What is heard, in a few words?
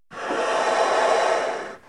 mask breathing